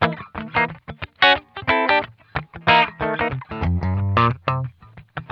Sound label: Funk Guitar; Zero G - Funk Guitar